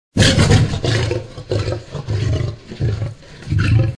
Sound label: Sounds of Lion